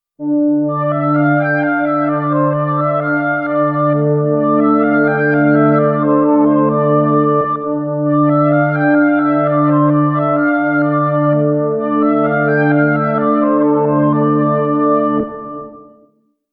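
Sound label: Chorus Female, Korg Poly-61 synthesizer; SoundDiviner Project; Korg Poly-61 Pack22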